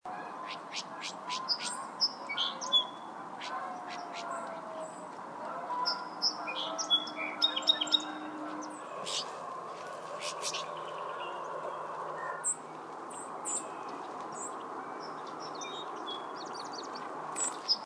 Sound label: song sparrow